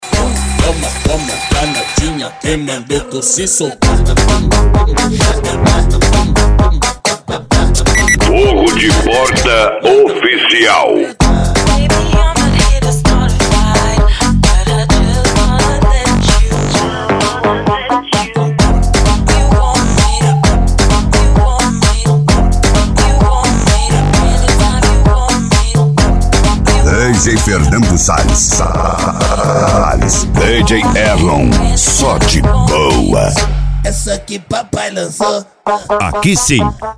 eletrofunk

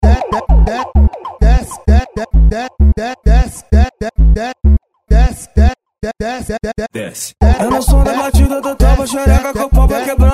funk consciente e hip hop

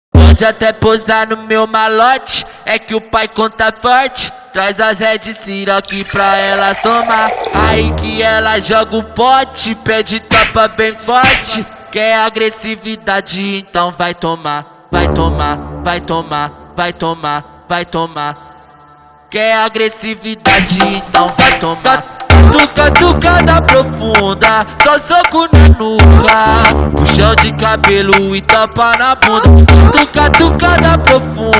catucada profunda

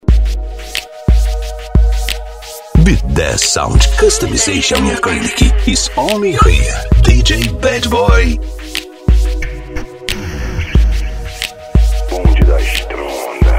rap nacional

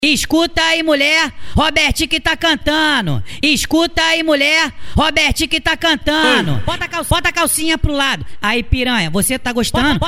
funk ritmado

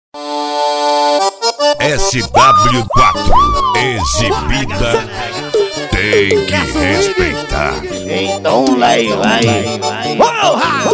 forró sampleado; cd sw4 exibida so as top

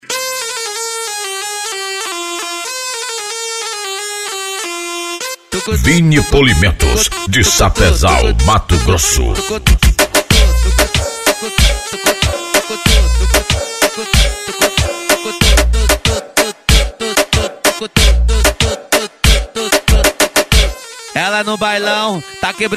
funk e rap

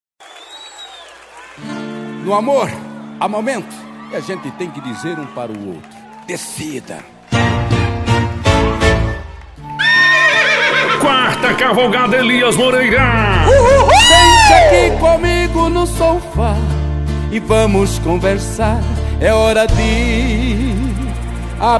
sertanejo classico

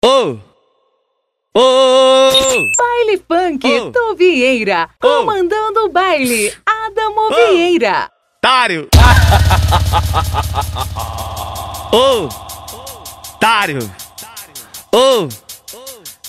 baile funk

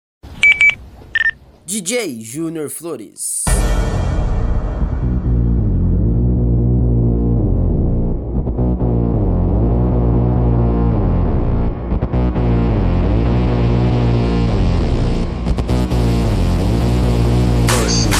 funk rave; projeto feste funkrave

